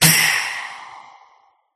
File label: Ice Spell Chill of Death; sound spark